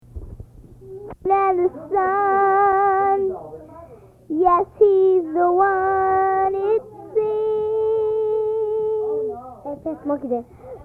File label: singing